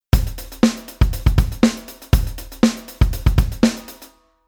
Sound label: Rhythm 16 beat Basic